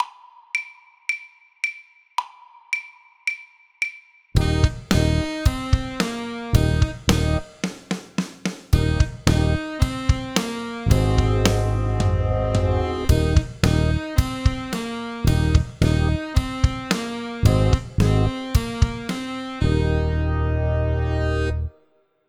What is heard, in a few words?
Rockin Wind Brass